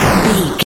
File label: retro explosion general